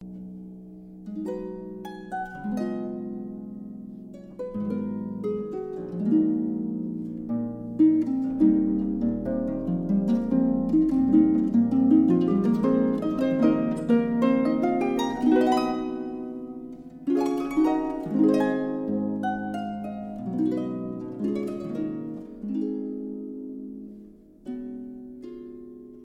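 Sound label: sound of playing harp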